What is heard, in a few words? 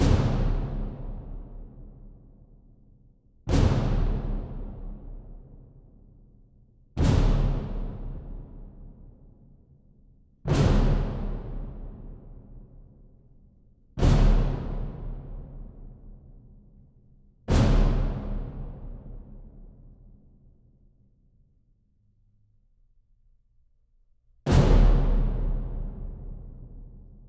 Combat Threat 120 Cm; Combat E- Tower3 Threat1 120 Cm 78 2